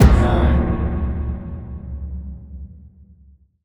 Lower Crescendo, Cinematic Metal Impacts

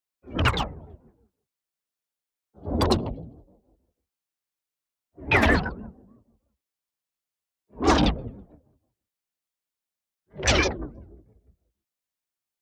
WHOOSH SMALL FAST Dizzy Shots; boom library; Neon

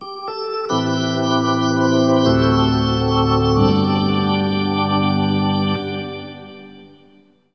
Hockey Organ USA

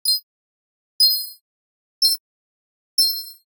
Generic Click Digital

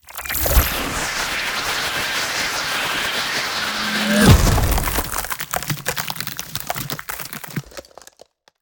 Ice Spell Ultimate Frozen Abyss; Ultimate Ice Spells; Arctic Aura

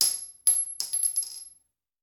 Bullet Shell; Sound Ideas; Guns

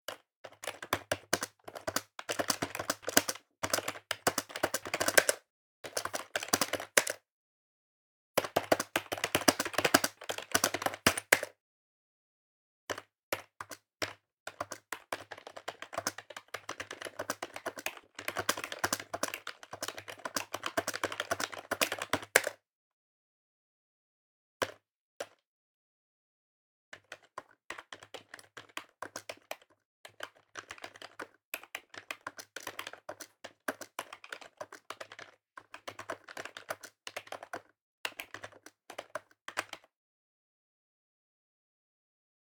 keyboard (desktop-ps2); devices accessories utilities; NS Studios - ultra sound collection

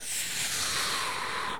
Bubblegum Blow; SFX Kit; Foley - Misc

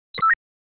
computer bleep; NCH Library

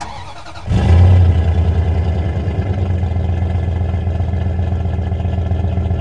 TRex Start Loop, Trucks

